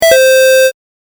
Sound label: correct; シンプルなピンポン; 正解の音・ピンポーン